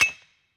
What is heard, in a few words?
mine stone